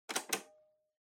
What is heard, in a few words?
Portable Stereo Tape Pause